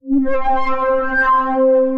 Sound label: Sonar Dive Bomb, Synth Pad; Ableton; Glitch and Wash